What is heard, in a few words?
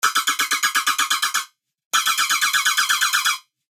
Atonal Laser; revealed serum 2 bass tech house vol 1